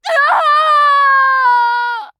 Falling; Paladins; Furia

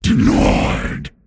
deny pain; Pudge

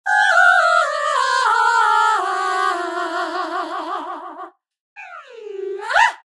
sing; Naga Siren

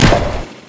Monkey King attack

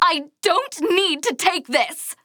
Taking Damage

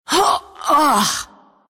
pain; drowranger

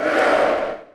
phalanx attack; Mars

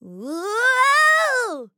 Falling; Seris

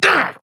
Grunt Hit; Drogoz; Paladins

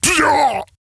Death; Raum; Paladins

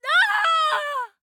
Falling; Paladins; Princess

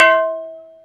homing missile target

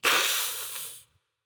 Death; Paladins; Maeve

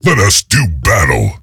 attack; Awesomenauts; Deadlift

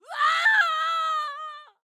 Scream; Pharah; overwatch

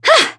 Grunt Attack; Tyra; Paladins

